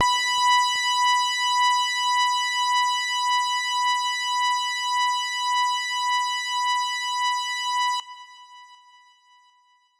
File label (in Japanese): 音程 女性 B4